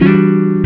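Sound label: guitar chord funk; Univers Sons; Jazzistic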